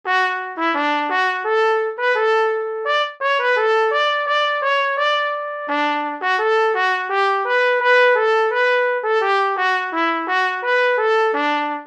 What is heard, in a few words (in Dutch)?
vivat trompet